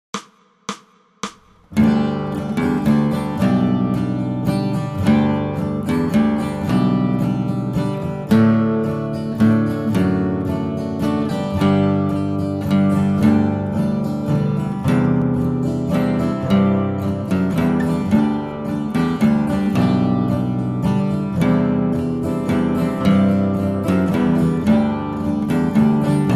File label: Bass Solo; Silent Night (bass solo)